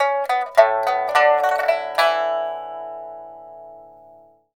PIPA PHRASES; Spectrasonics; Heart of Asia

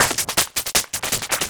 Beat, Industrial

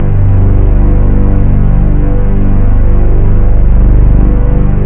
bigsynth; haunted; ORCH; jazz jackrabbit 2

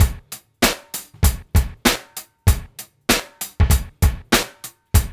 DRUMS, Funky Ass Loops

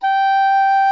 ENGLISH G5, REEDS; Greytsounds VOL-1

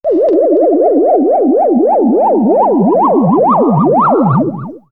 Time Warp, synthfx; X-Static Goldmine

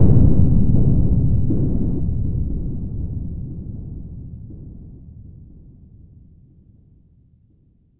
Filter Xplode C2; FX; Club Techno

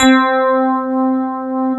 FM ELP 6 C3 R, Keyboards Old School; USB Soundscan vol.09; AKAI

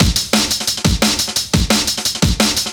Brk Full 175, breakcore breaks

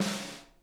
snare, drum kits

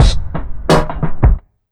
BEAT, Underground Hip Hop; USB Soundscan vol.02; AKAI

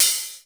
OPHH, DRUMS; Crypton - DrumBase5000; T1 DRUMS

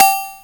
perc, opl3; M8

